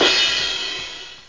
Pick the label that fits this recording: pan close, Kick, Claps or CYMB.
CYMB